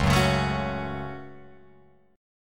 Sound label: Db Suspended 4th Sharp 5th, Guitar Open F; strummed